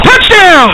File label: land; space pew